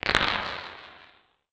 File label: Dna push sfx